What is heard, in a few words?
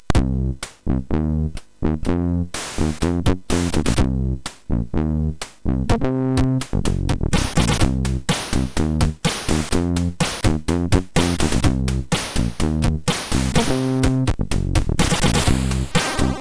Session Tune sid MOS6581R4; hvsc